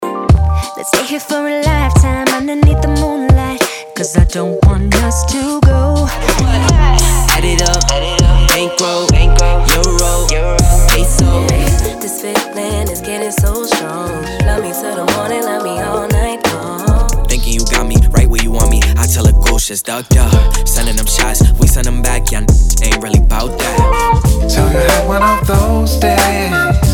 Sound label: Hip Hop RnB